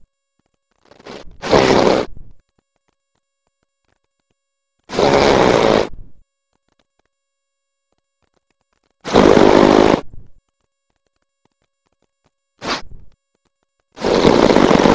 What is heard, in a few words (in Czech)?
chrap